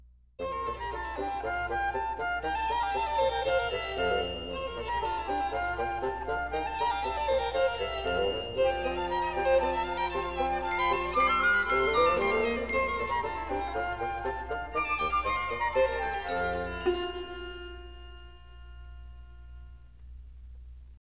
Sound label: Deck the Halls (Welsh traditional, instrumental)